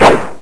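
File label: swing; misao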